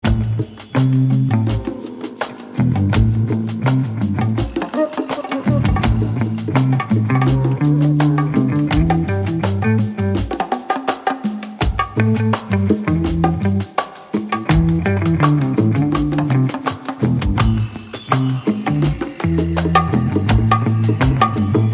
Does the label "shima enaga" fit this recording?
No